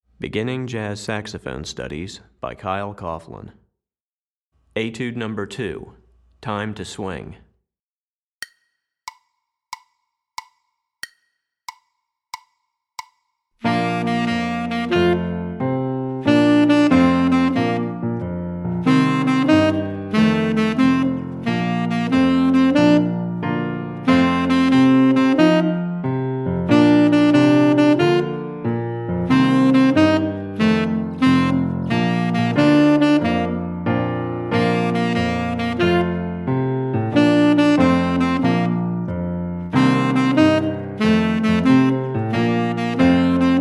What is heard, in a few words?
Tenor Etude, Saxophone; Recordings For Beginning Jazz Saxophone Studies for Tenor, Volume 1; Etude #2; Swing Eighth Notes